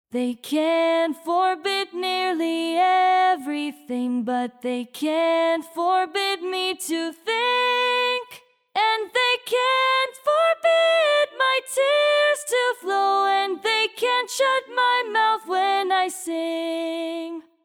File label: voice UPPER HARMONY